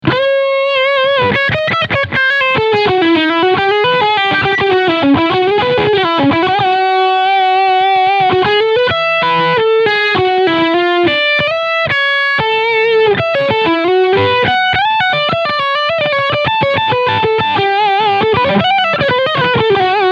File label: Germanium Filter Drive; Death by Audio; Filter 3 Uhr